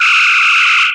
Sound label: ship phaser; federation